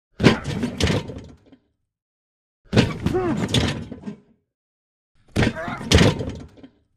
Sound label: Player Blocking Sled, football